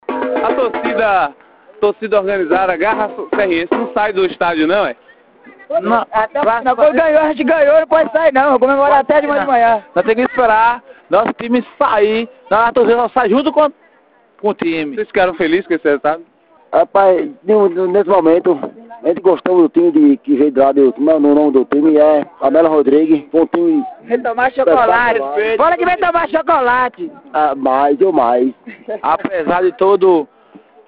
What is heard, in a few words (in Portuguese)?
torcida de serrinha ta feliz com o time e mostra grito de guerra